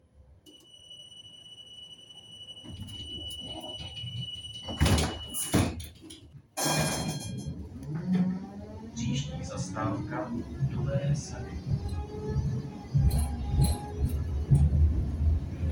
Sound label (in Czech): ruch